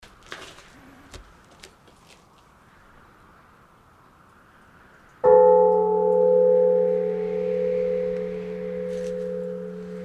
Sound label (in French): cloche; Estagel